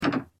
Door Locked; Granny 3; Android

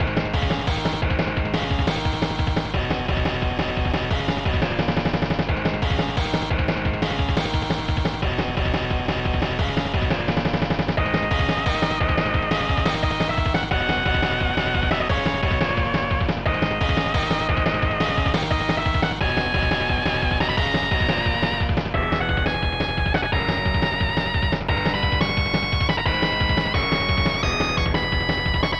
Battle with Robot; Makeruna Makendo 2; SNES

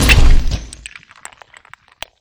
Heavy Meat Rock Impact

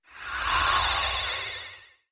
robot death; enemydeath

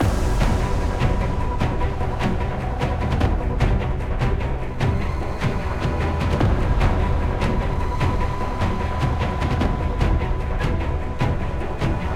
Combat Music; XCOM Enemy Within

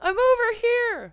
Woman Idle; In the Line of Duty Firefighter

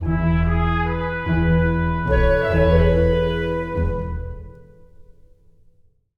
Event Fanfare; game rip; lego worlds monster pack

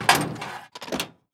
Hose Get; car simulator 2

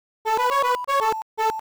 Nose Flute; SpongeBob's Atlantis SquarePantis

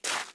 Footstep gravel

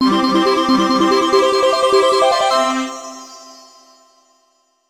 Collect Banana Medal-Battle Crown; donkey kong 64 hd n64 1999

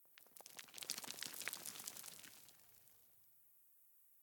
Rocks and Dust Movement; The Town; 3D Environment Clip

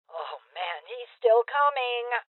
Voices Heavy Hit Female Old; rebel cops 2019

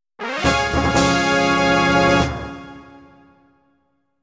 victory jingle; tokimeki memorial 2

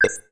Ui Button Cancel Click; pangya fantasy golf pc

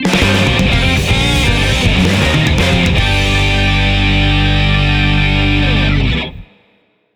BGM Win; Naruto: Clash of Ninja Revolution; Wii

